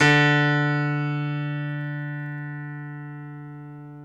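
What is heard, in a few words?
piano, Grand piano; Club-50 - Foundations Roland; YPno 1 S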